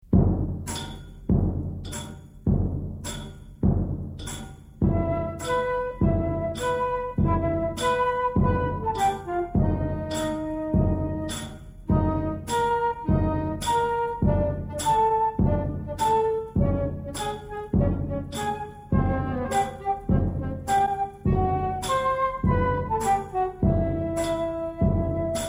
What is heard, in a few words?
barrel music; hobbit